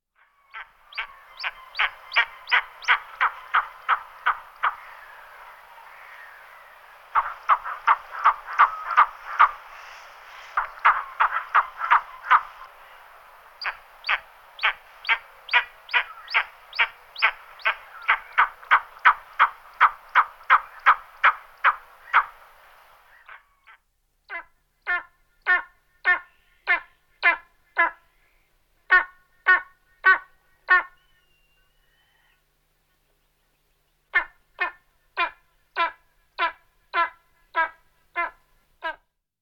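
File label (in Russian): mogilnik golos, eastern imperial eagle voice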